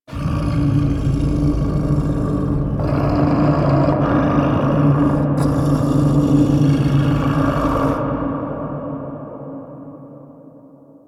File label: Misc Mitw Shouts; Ambience; Man In The Wall; Entrati